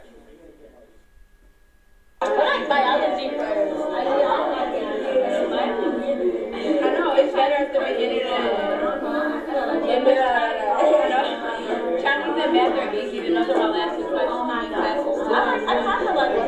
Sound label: School